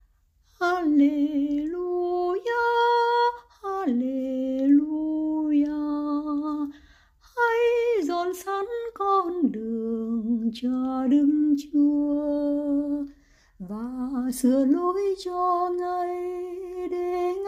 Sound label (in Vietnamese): Halleluja Nam